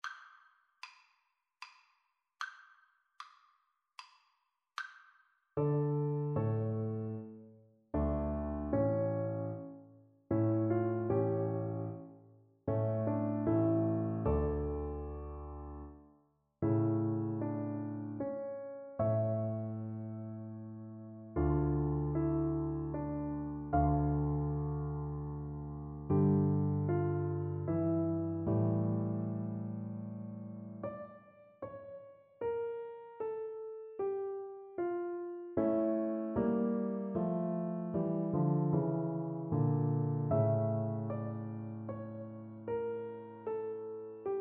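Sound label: recorder karaoke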